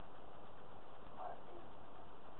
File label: growl; look at me